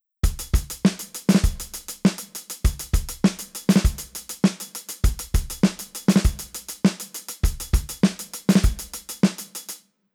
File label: drum